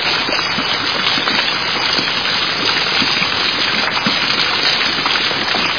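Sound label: water current sharp noisy stormy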